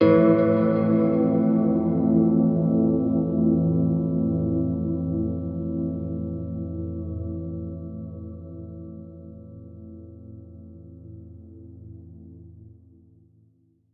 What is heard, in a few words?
guitar; Void Bastards; Krell